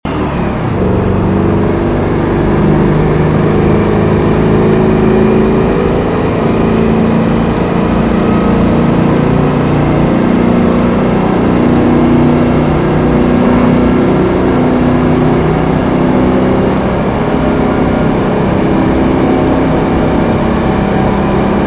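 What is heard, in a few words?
third, car engine; tdmcars; coupe40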